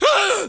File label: death; Undercroft